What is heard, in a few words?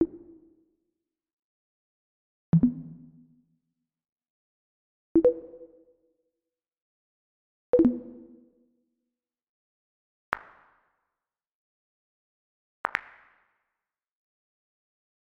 Ui Buttons